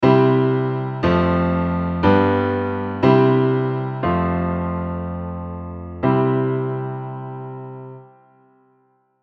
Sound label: Plagal; cadences